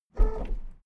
car lift up short

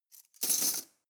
cutlery teaspoons drawer place

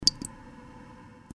water drop

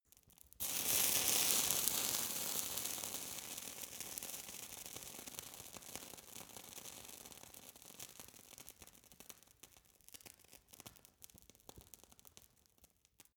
fire torch sizzle long

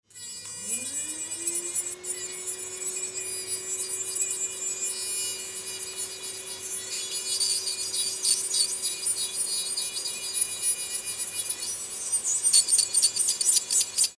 dental drilling

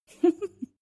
female laugh quiet

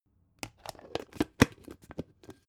plastic bowl small close long

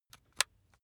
razor attachment extend

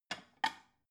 plate lift soft

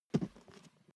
step barefoot metal single right

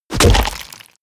club impact crack wet

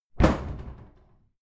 cupboard kitchen close hard

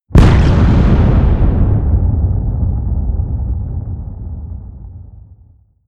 big explosion

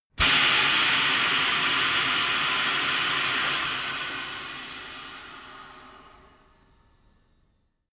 tire changing machine hydraulic hard in

